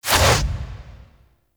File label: Melee Block Attack, Whooshes; Warframe; Weapons; Blocks